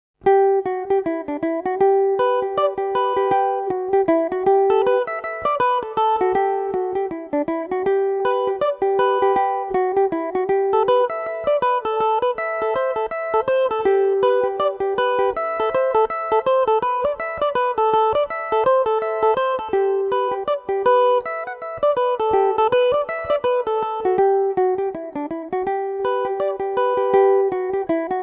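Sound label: A dorian, irish mandolin; mamas pet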